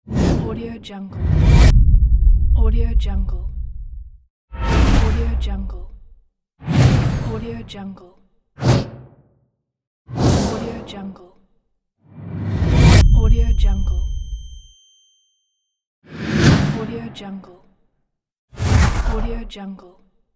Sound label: whoosh